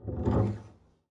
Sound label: pizz noise strum long, double bass